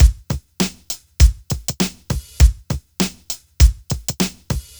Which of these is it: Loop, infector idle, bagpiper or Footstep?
Loop